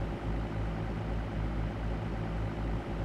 idle, vehicles explorer; gmod; sgmcars

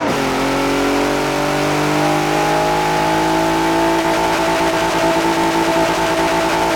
third, vehicles explorer; gmod; sgmcars

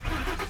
start, vehicles explorer; gmod; sgmcars

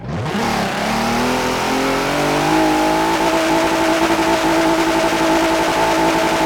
rev, vehicles explorer; gmod; sgmcars

